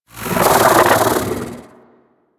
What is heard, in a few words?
bug vocal alerted